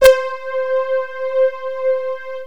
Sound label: syn, loops synth